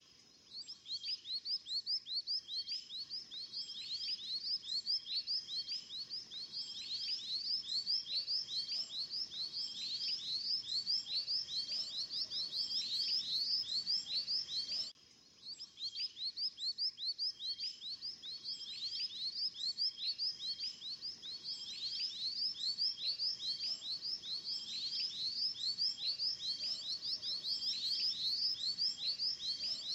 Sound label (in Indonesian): suara jingjing batu